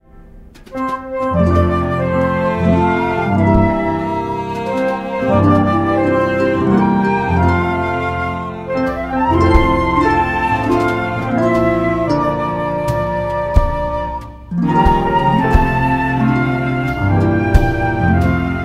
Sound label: Happy Birthday To You, 6 Instruments; Core Keeper; Desert of Beginnings